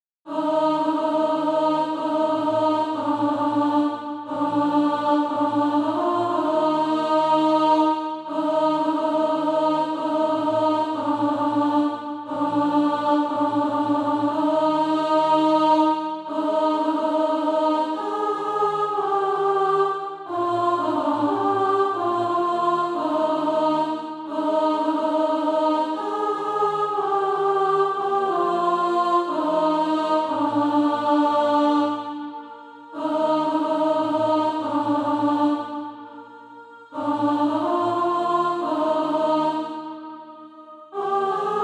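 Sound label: alt, Practise Music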